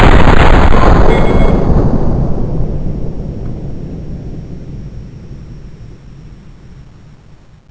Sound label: mk23 fire